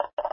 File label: Set Empty Cup; papas cupcakeria online windows gamerip 2013